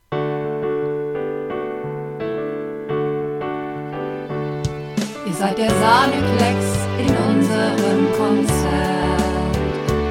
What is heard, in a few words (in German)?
Mehrstimmig; Werbemusik